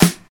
good steel snare drum sound f key, snare sound tuned to the F note; snare-drum-samples